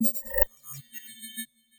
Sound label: subtle appear; soundmorph